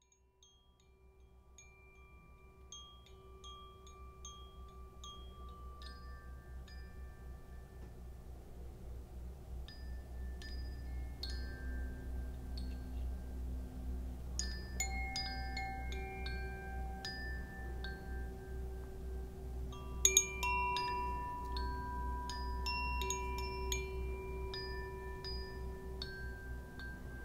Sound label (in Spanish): Koshi viento